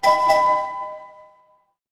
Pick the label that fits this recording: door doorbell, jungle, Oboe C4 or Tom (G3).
door doorbell